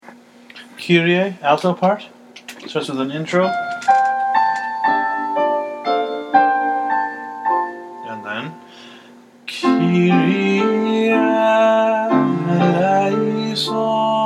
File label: alto; kyrie mass xvi